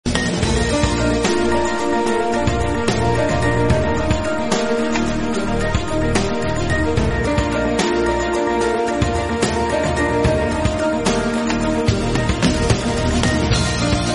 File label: bihu background music instrumental